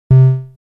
Tristar, synth lead

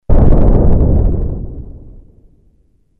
Explosion

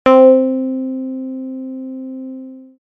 Triton, synth lead

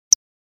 Claves

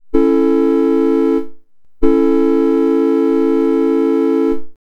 Train Horn

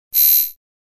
Swamp animal

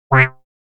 Shorty, synth lead

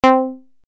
Pizzi

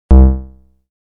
Fat Bass; instruments

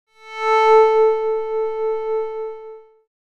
Galactic viol 440 filt

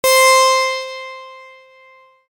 NH harpsichord

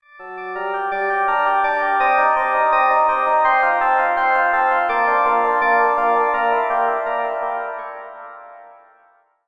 Ganymede Organ Eta

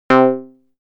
Multiwave Lead

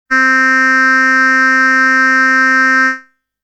Oboe A

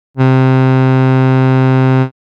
Woodwind, synthlead

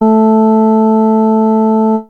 Organ 220Hz

